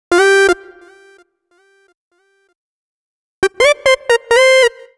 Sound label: Synth Melody, Cinematic Funk; Sonic Foundry (Sony Creative Software); Cinematic Funk Urban Tone Poems